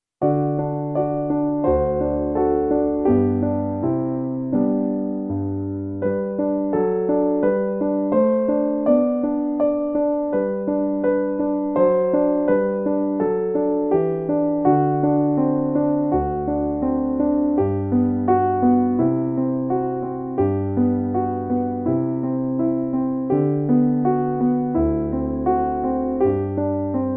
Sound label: Choclate cheesecake accompaniment, violin